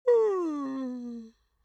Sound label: human vocal male yawn high